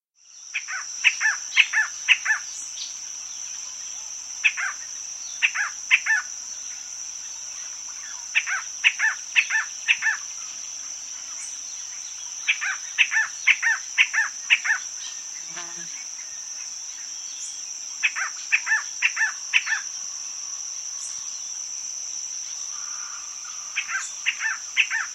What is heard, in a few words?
Jet Antbird; S. America